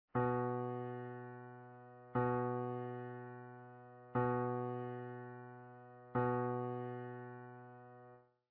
low b; tuning

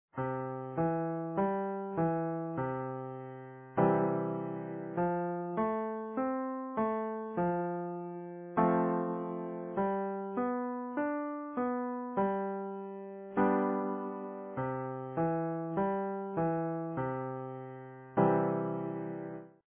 triads

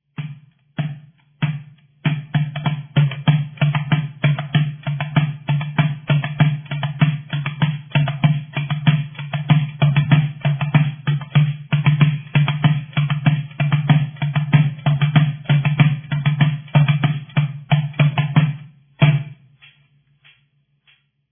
country

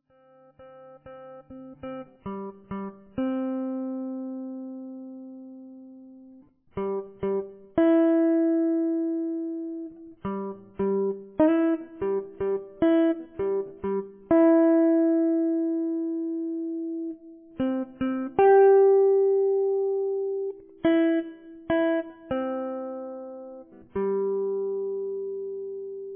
tap

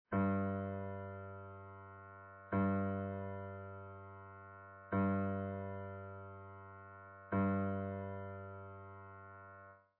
low g; tuning

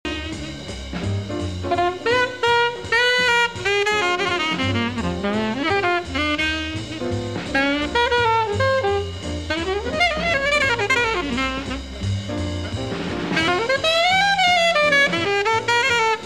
bird blues

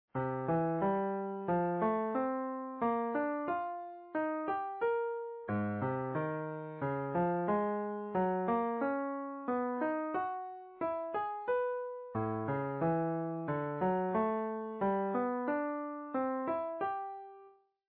loops

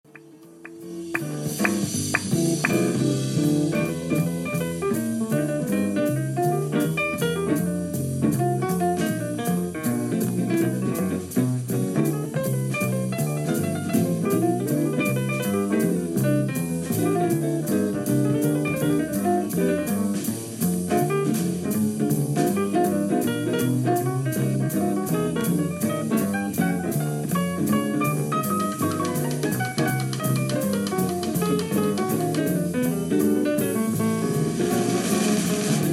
jazz p

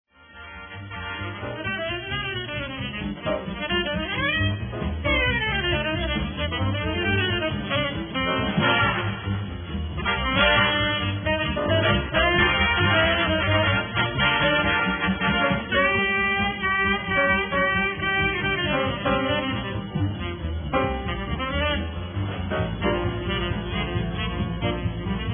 swing

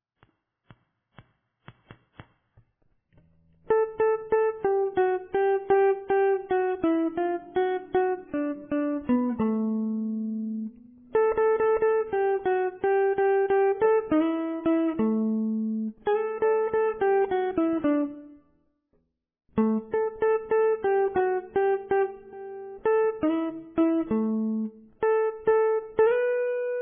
waltz

